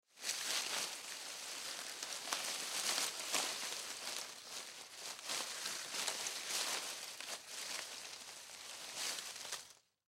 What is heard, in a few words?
Brush Foliage Move, Foley Brush Foliage Movement; Backgrounds; Forests And Jungle Sound Effects; Blastwave